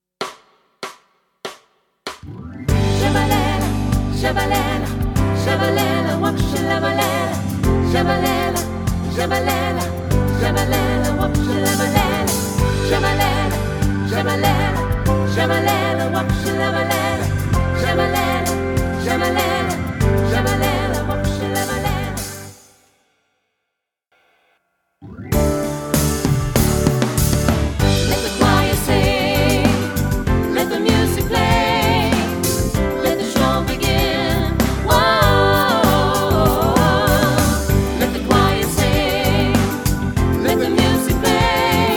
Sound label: Let the choir sing; Alla stammor